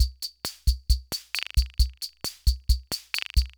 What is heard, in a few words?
Loop, sr-95; classic machines